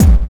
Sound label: Track Kick; Construction Kits; Sonic Foundry (Sony Creative Software); Drums & Drones